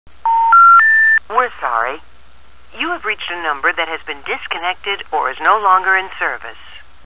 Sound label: Phone Disconnect Tones and Message